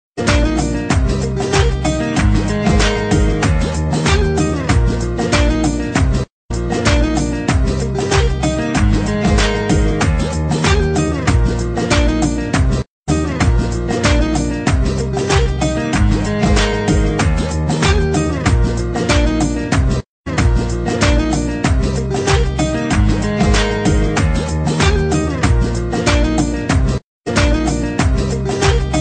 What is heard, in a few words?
Guitar